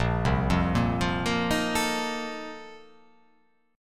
A sharp Major 7th Suspended 2nd Suspended 4th A sharp